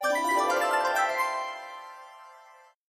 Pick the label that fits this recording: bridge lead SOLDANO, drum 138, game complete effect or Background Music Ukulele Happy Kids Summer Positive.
game complete effect